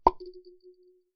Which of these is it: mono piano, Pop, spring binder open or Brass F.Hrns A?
Pop